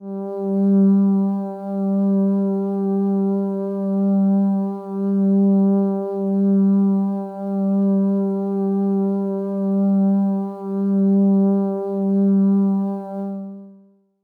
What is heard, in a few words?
Analog Strings Kawaii G2; pads